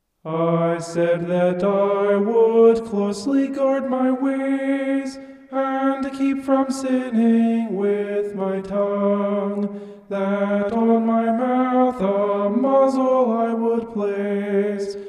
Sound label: vocal homoph; psalm 39